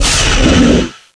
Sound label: pain